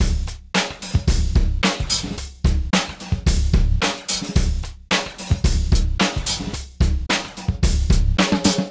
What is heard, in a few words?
110, Beat Loops; Bugga; Zero-G - Monster Beats